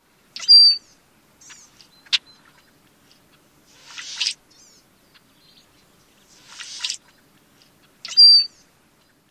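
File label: song and call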